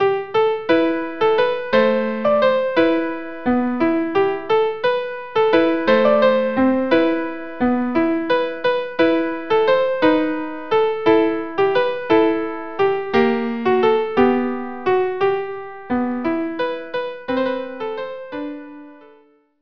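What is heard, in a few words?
Canzonetta Tarantella viola duo; Rimsky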